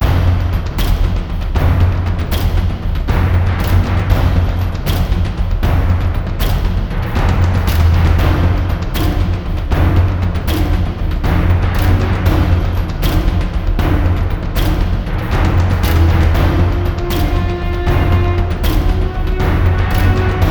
music; Quake 4 Alpha

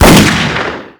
Pistol shot short; Doom

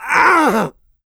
pain large, player; DoomRTX; big

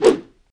Imp Attack Melee; DoomRTX

